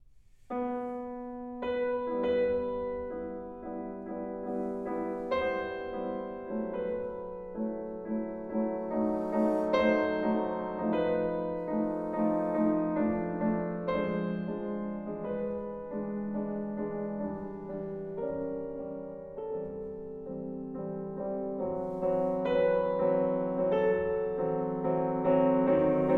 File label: Chopin 24 Preludes, Op 28 No 4 In E Minor